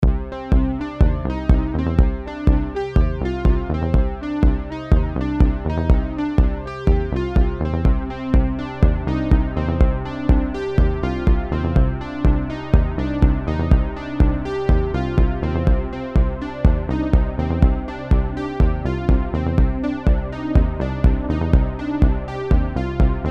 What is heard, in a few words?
Roland Juno106 Roland Juno60 with ProOne Bass